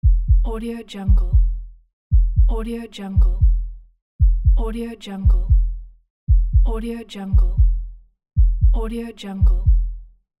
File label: heartbeat; dicecca